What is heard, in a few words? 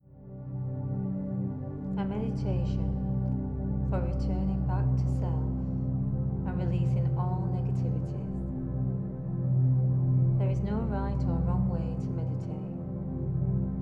chakra meditation